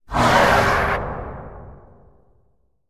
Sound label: Animal Stinger Bull; stingers & whooshes